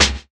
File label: SNARE; E-Lab; X Static Goldmine 2; akai